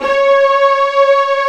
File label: CMI bow; Greytsounds